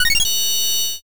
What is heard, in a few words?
fullcombo